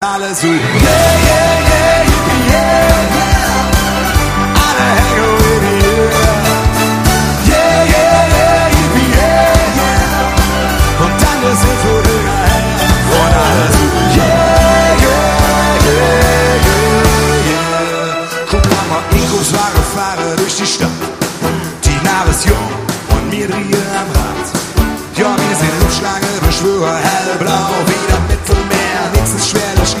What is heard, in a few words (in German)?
live mit orchester